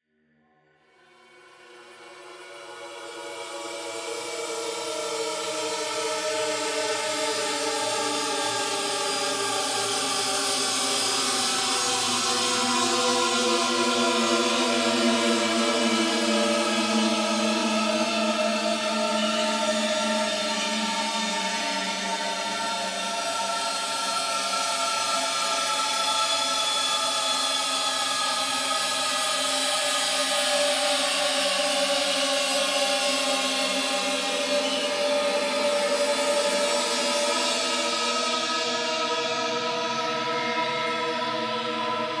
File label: Drones Distorted; Gothic Storm Music; Advanced Sound Design 2012